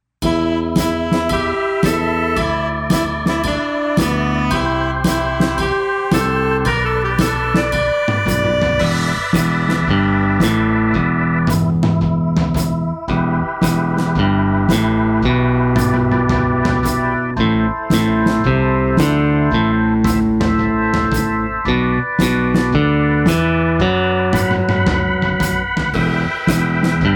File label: Chicago Blues